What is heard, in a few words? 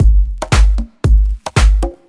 deep bass tech kick loop; drum loops